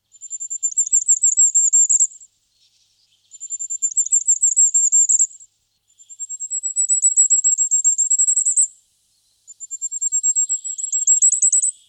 korolek krasnogol

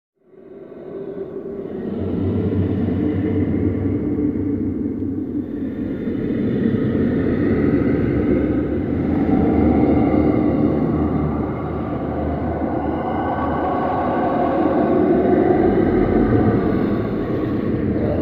strashnye zvuki

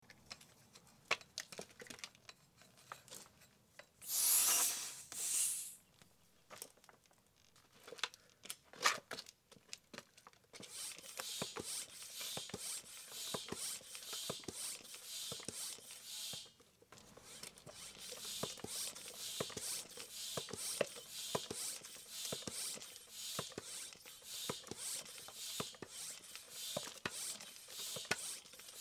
nasos avtomob ruch, Звуки ручного воздушного насоса

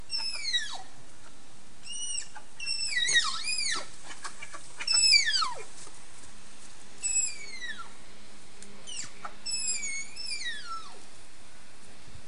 tapir, zvuk tapira

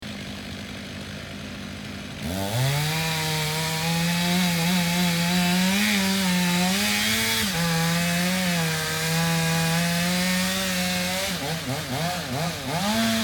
padaiushee derevo

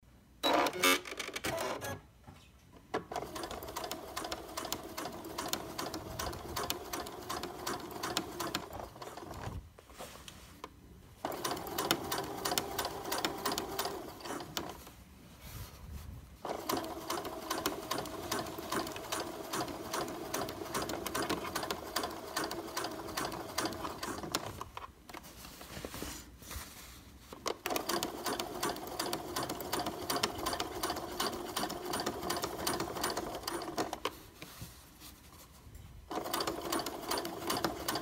vkl shytie i vykliuch mash

